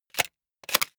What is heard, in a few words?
sci-fi cock plastic; comedy-cartoon; pistolen-gewehre